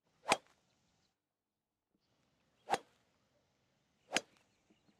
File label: Golf Practice Swings